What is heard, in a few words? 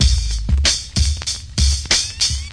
094 strut, drum loops and breaks; 091 to 100 bpm